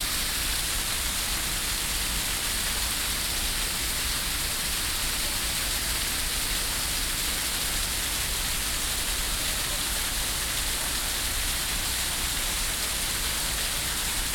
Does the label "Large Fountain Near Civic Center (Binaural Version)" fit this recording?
Yes